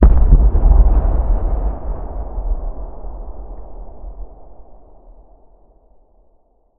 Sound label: Explosion Gasbag; War Ambience; Prydwen